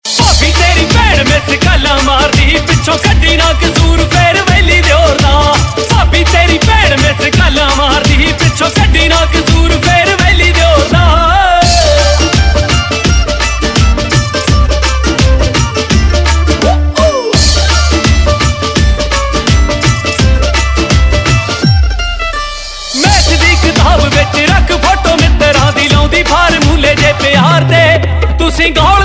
Ringtone, Punjabi

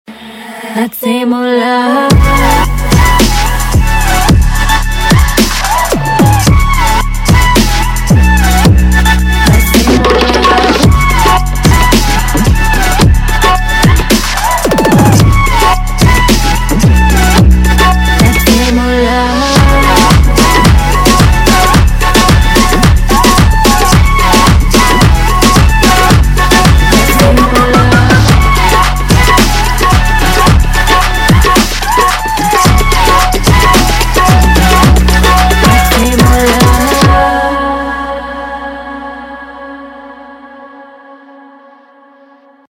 Best Instrumental Tone

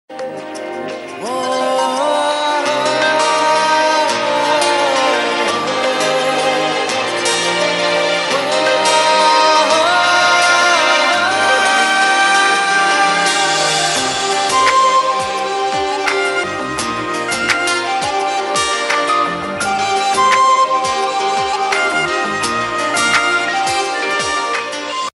BG Tune; Zee TV; TV Serial Tone; Mayank And Gunjan

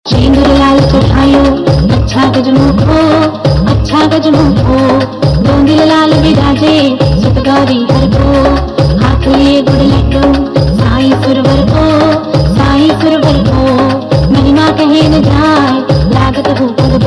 Ganesh Aarti; Kasauti Zindagi Kay; Shendur Lal Chadhayo

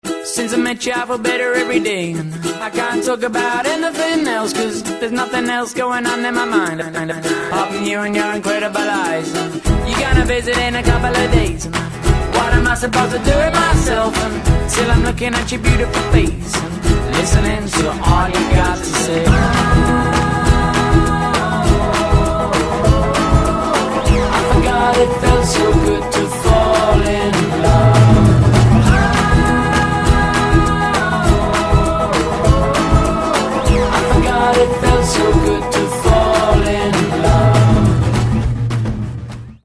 TV ad song; Samsung SMART CAMERA NX mini

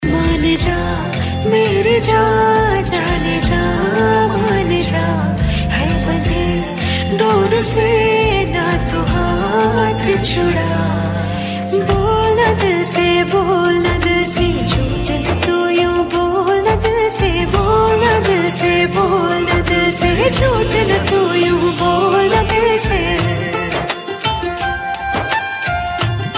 Tune; TV Serial Tone; Life OK; Tumhari Paakhi

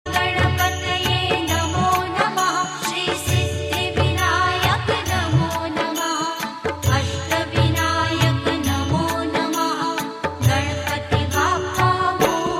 Female; Ganesh Chaturthi Special Ringtones

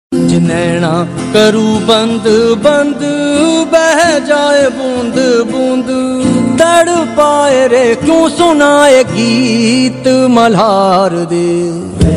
Iktara Male Soft

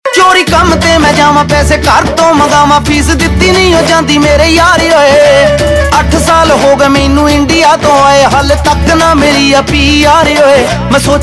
Ringtone, Punjabi

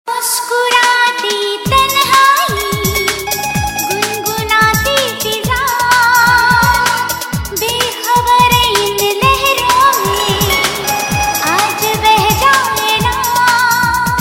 Background Tune; Sanjivani - A Medical Boon

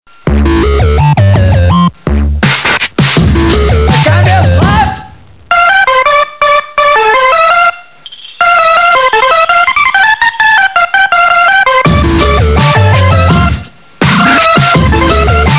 Nokia 3220 Music

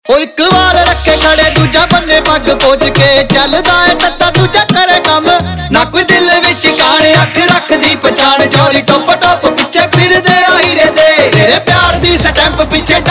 Ringtone, Punjabi

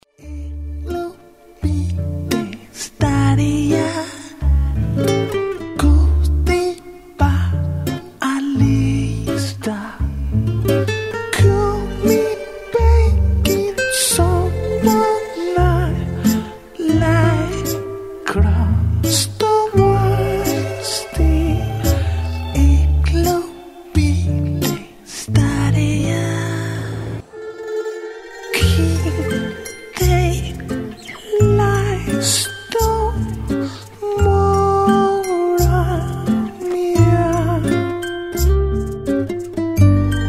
Love Note TV ad song; Samsung GALAXY Note 4

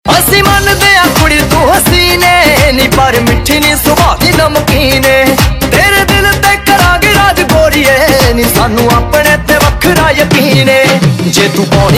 Ringtone, Punjabi Pop

